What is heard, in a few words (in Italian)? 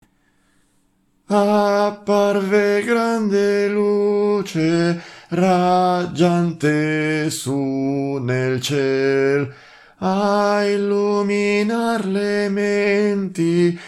soprani